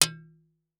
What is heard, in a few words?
Impact Street Sign Metal Hit By Wooden Stick